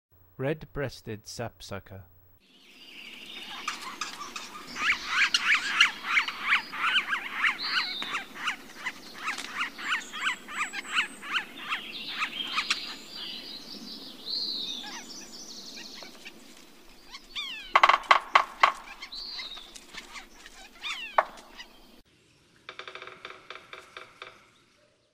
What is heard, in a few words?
Red-breasted Sapsucker, Songs of Birds of North America; Western USA; Western USA Songbirds